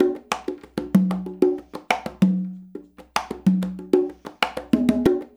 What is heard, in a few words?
LOOP, CONGAS, Percussion